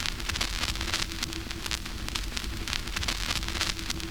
CRACK; E-Lab; X Static Goldmine 2; akai

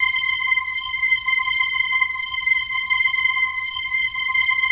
PHASE PAD, ATMO PADS; Best Service; XX Large

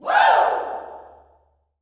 "Woo", Vocal Gospel yell shout; Spectrasonics; Vocal Planet Vol.1 Gospel; akai

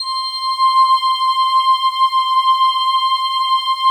PAD, Pads; Best Service; XX Large

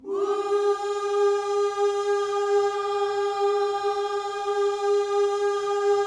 WHOO G#4, Vocal Gospel; Spectrasonics; Vocal Planet Vol.1 Gospel; akai

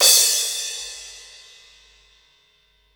PERC

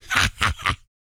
GRUNT

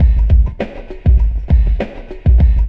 LOOP; E-Lab; X Static Goldmine 2; akai

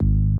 dub bass; ZERO-G; Datafile

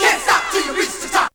VOC

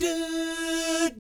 DOO SFZ, Vocal Jazz Fx

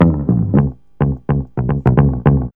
B-LOOP

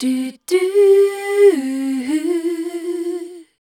C, Voice Spectral; MELODIC; Best Service; Voice Spectral II

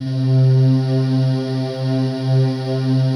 pad, atmo pads; Best Service; XX Large

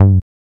BASS

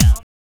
BD; E-Lab; X Static Goldmine 1

